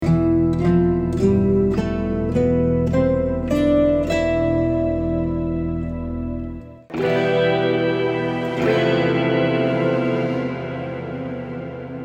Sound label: metal guitar scale